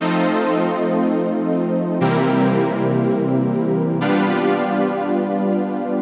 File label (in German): es dur nebenakkorde